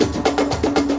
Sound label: Drumline Loop